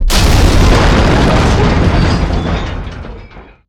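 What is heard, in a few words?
tower explosion; Modern Warfare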